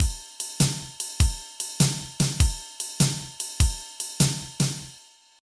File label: shuffle